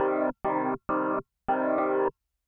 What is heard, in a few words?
Phaser